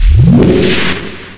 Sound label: power